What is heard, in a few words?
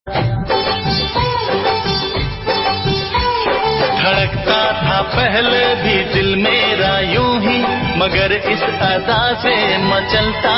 best bollywood romantic ringtone